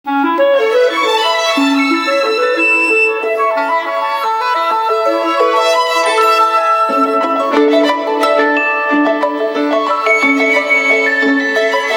Ring Affettuoso; Vertu Constellation Quest

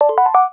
Notify

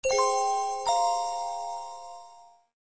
SMS; Samsung SGH-i617 BlackJack II

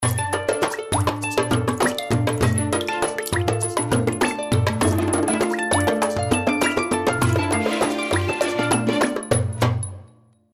water; Verykool; S757

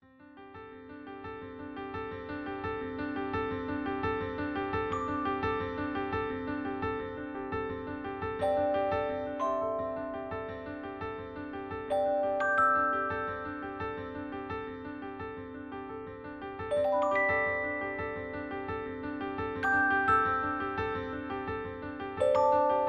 Mystic Breeze, ringtones; LG K92 5G

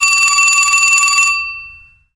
Vintage Telephone

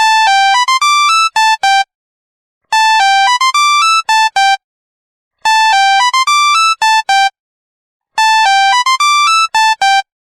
Beep Classic, ringtones; SKY IM-A850S-VEGA-R3

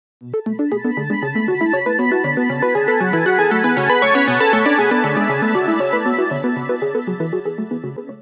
Quadratic, phonetones Nokia 9300i

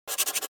Key Tone Star; LG SU780 Lollipop 3

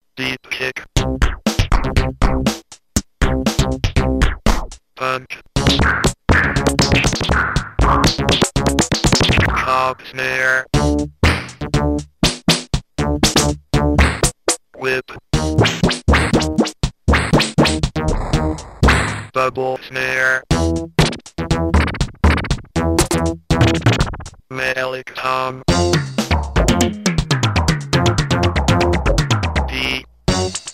Drums

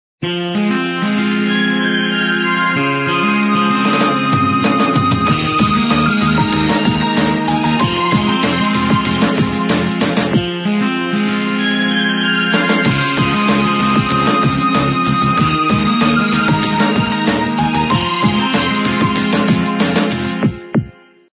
Default Alarm; Sony Ericsson; P990i; SystemSounds

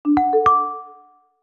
Leap, notifications; Huawei HarmonyOS 2.0

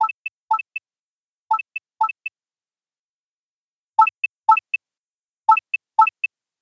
Drip, alarms; Google Pixel

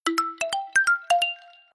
Splash; Sony Ericsson; F100i Jalou

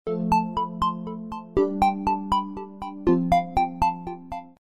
Short pop; ZTE Z222-AT&T

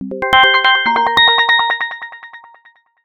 Caraway, ringtones

